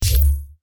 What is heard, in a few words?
enter